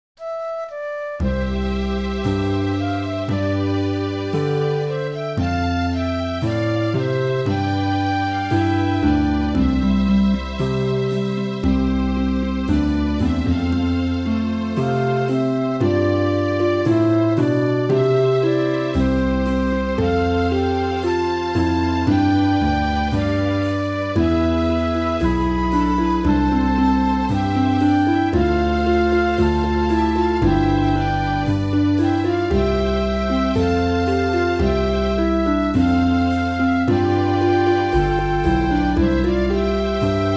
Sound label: instrumental